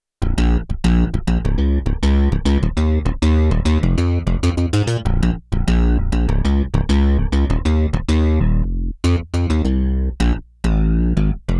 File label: Meaty Bass, Roland JD-800; Factory Patches